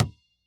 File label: collision plastic; flip the water